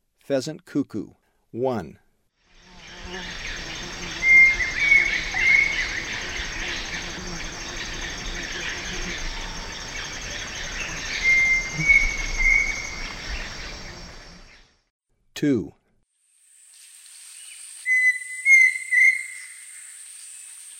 Pheasant Cuckoo; S. America; Eastern Lowlands

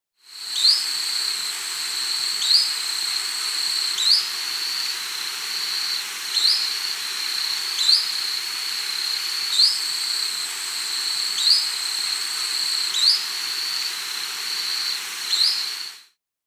White-throated Jacamar (Brachygalba, S. America

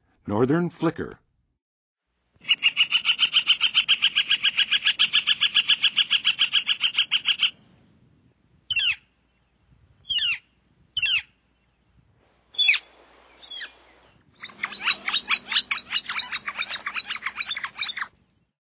Northern Flicker, Songs of Birds; North America; Western USA; Western USA Songbirds

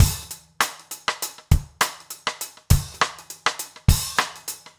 basic funk 100 rims

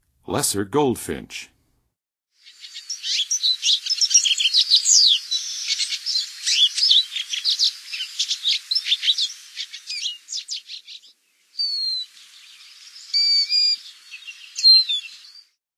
Lesser Goldfinch, Songs of Birds of North America; Western USA; Western USA Songbirds

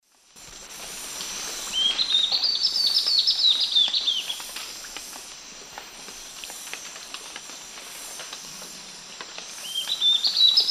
Hylophylax naevius, varzea, song; S. America; Eastern Lowlands

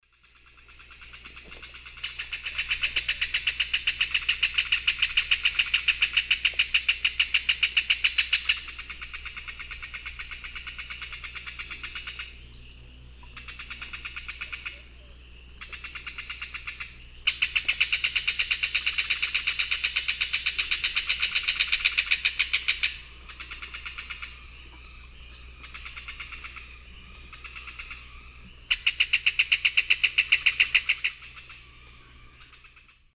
Megaceryle torquata, calls pair; S. America; Eastern Lowlands

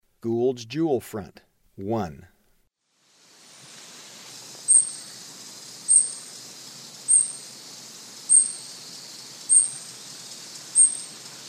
Gould's Jewelfront; S. America; Eastern Lowlands

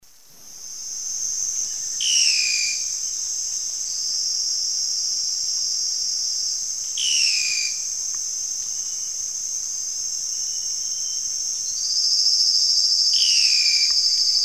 Lophotriccus vitiosus, calls; S. America; Eastern Lowlands

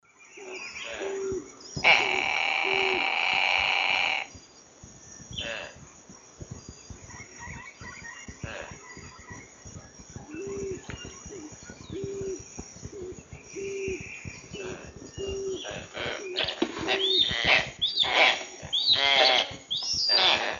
Busarellus nigricollis, calls of 2 birds; S. America; Eastern Lowlands

